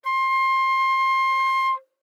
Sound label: Flute nonvib ff C6 stereo; Woodwinds